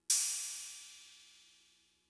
Cymbal, Fricke MFB-522, Drum Machines

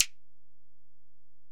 HiHat, Alesis DM-PRO

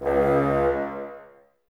Bassoons, C#2; Wood Winds; Miroslav Vitous; Woodwind Brass Ensemble

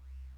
Bass Synths hits and octaves

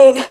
fx vox; E Lab; XTc Files of House

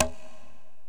Korg x5, Drums

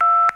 TELEPHONE, TECHNO; Crypton - DrumBase5000; TECHNO #2

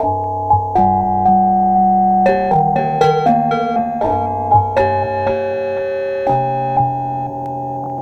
Melody, IDM

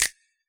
Analog Snaps, Yamaha DJX; 155 DJX Kit

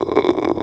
TB THROAT OO, Talk Box

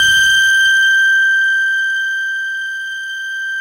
CELLU G5, Yamaha VP-1; Music Store; 90's Sample CDs; CELLULOID

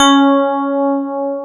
PIA DX E-PIANOS

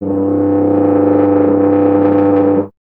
BRS TUBA, Solo Instruments

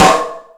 All Snares, Drum Machines

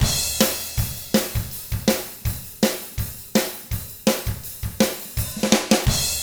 ROCK, Double Platinum Drums

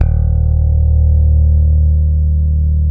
PRECISION BS E 2, Bass; Spectrasonics; Bass Legends

